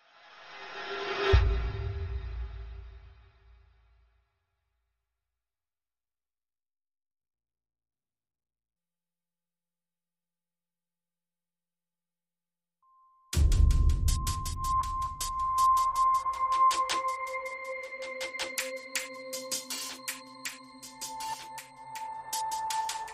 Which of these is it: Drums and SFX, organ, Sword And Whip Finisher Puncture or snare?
Drums and SFX